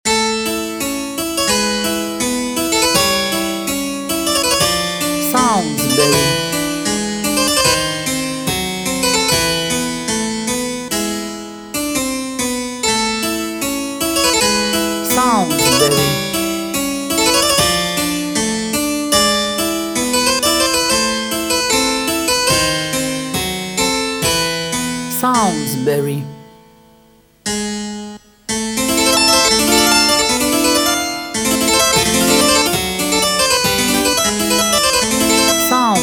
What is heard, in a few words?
Toccata